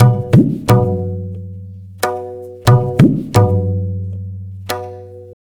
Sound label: tabla, 90 bpm; Zero-G; Pure Tabla